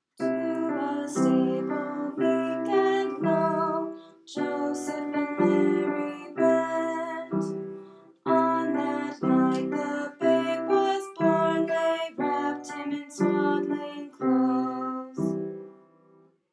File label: recitative